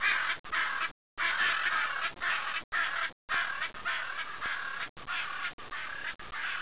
macaw; 12000 Graphics Pack - A Complete Graphics Collection For Your PC

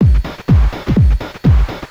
DRUMLOOP HOUSE 125; eJay

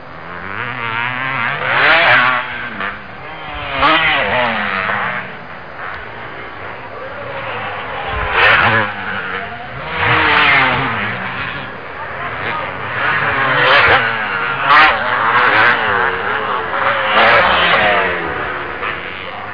DIRT BIK, MISC VHCL

